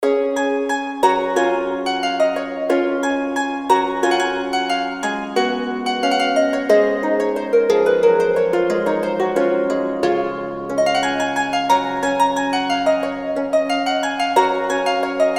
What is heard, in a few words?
ren harp; dowland puffe